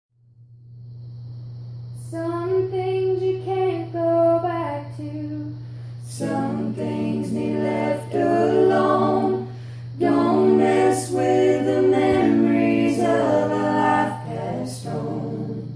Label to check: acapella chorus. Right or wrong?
Right